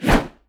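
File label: swing axe, one hand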